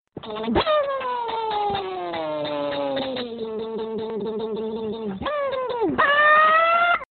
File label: Bing Bong